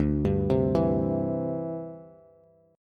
D 7th Suspended 2nd Suspended 4th D, Bass; D7sus2sus4 arpeggiated

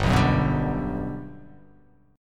G Major 7th, G, down

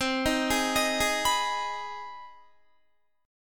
C Minor Major 7th Sharp 5th, Guitar; CmM7#5; arpeggiated

